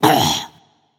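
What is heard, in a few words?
grunt hit, female; smite; voicepack; hera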